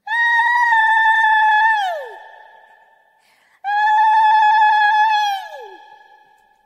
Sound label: warcry